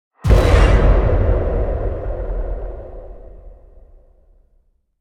Shocking; LOOPERS

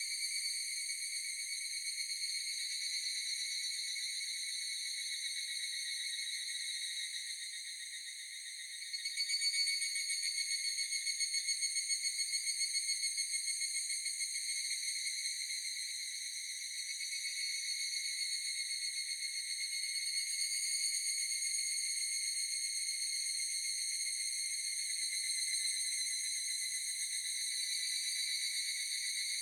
Voice Of Cicadas; LOOPERS

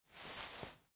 Rustling Of Clothes; LOOPERS

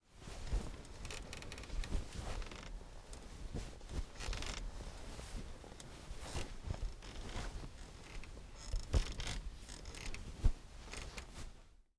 Treasure Hunt; LOOPERS

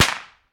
Cracker; LOOPERS

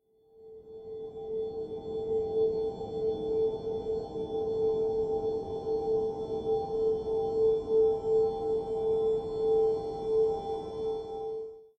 Tuning Fork; LOOPERS

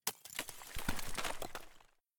Break; LOOPERS

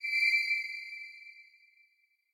Vanish; LOOPERS

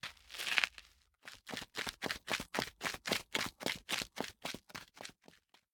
Look Back; LOOPERS

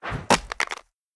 Throw A Phone; LOOPERS

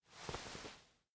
Rustling Of Clothes; LOOPERS

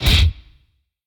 Kick; LOOPERS

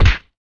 Head Butting; LOOPERS

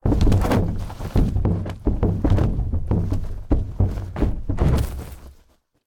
Footsteps Go Down Stairs; LOOPERS

